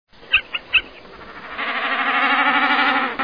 canto Agachadiza Comun

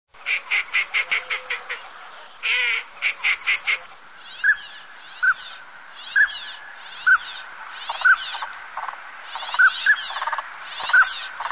canto Anade Rabudo

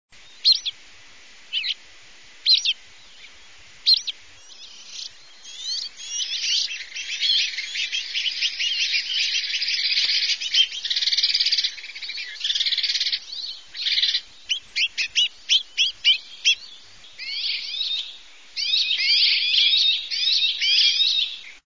canto gorrion chillon; aves campo

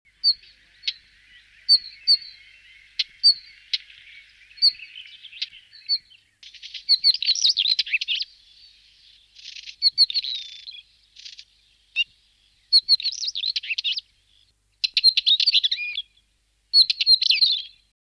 canto collalba gris; aves campo

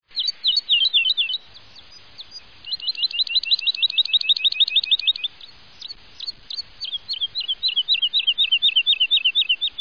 canto totovia; aves campo

